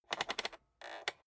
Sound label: rotary control short turn creaky